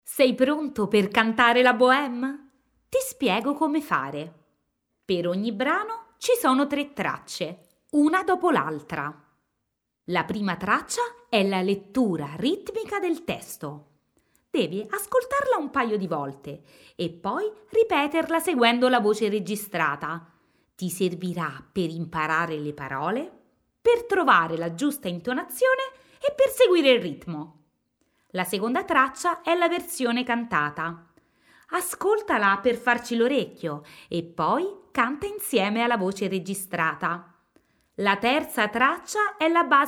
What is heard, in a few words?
Karaoke